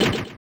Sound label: equip; deltarune yellow translation